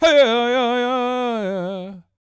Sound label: World Voices, CHOCTAW